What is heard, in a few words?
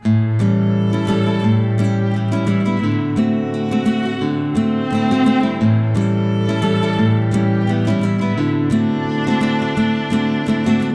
Front Porch Song (no drums)